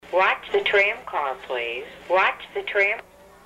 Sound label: tramcar